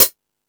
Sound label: High Hat, Drum Machines